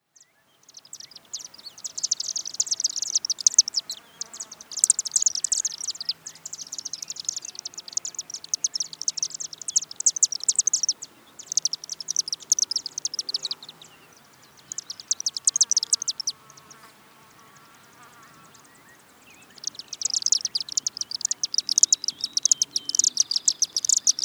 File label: Chimney Swift